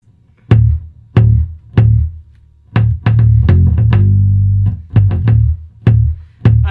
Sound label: Bass